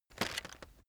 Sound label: chocolates take soft